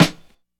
perfect snare sound E key, snare drum sample tuned to the E note